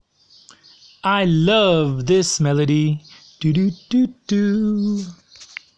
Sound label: live melody